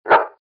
bark dog

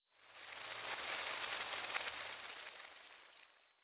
Rain

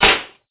gunshot

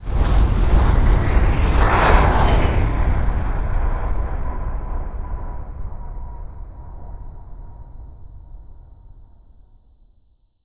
spaceship

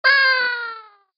yey

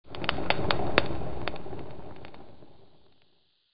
fire fade out

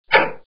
LEVER fade out

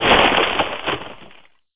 rock scrumlbe

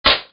gunshot

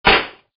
gunshot

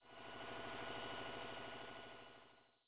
Generator

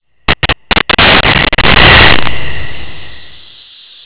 crickets fade out

